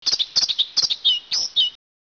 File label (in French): grive; gibier